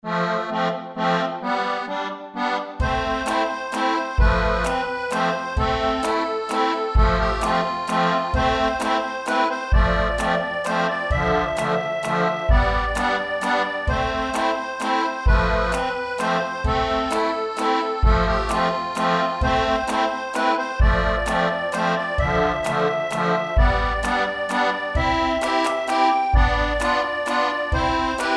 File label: accord